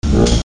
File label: energy and rays